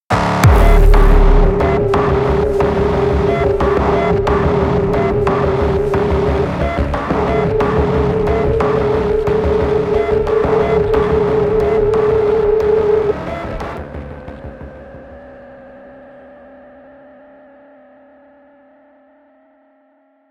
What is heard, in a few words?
Main Ambient; Blood Harvester - Main Ambient 10